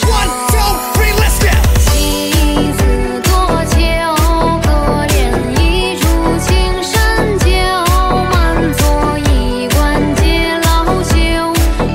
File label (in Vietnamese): Nhac chuong remix; TikTok